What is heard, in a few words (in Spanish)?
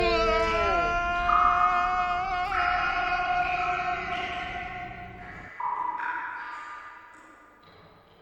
gritos